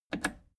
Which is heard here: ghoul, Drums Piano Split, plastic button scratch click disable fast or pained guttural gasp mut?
plastic button scratch click disable fast